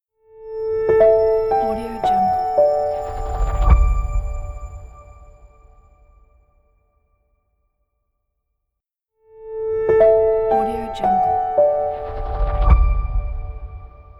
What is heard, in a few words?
light elegant piano logo